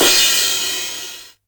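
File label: 909 cymbal tape; Goldbaby Tape808 & Tape909; The Tape 909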